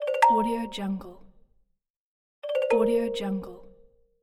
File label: incorrect correct answer sounds